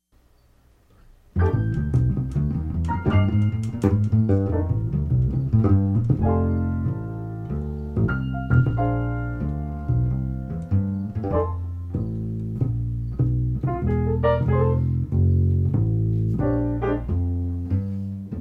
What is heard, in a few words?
Pizz